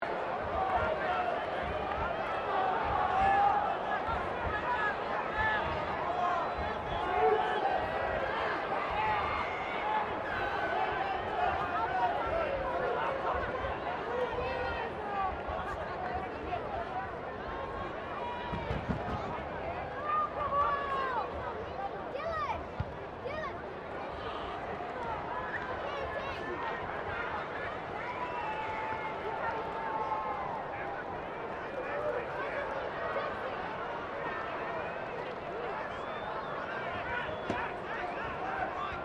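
crowd 15K Rugby World Cup ambience low loop, Rugby world cup game; Crowd Sound Effects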